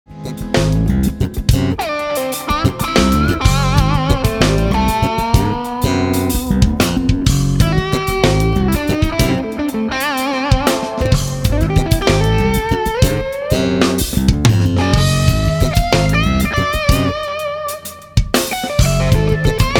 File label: sushi solo